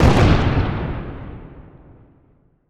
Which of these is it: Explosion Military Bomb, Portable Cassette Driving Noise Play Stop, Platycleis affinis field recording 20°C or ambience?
Explosion Military Bomb